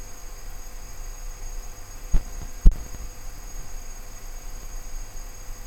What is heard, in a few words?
radiation HP 30Hz; lhc collimation